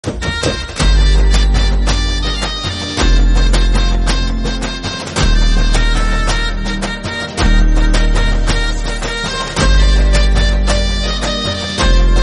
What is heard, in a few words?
Instrumental